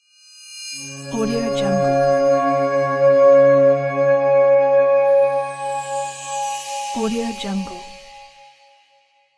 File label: magic intro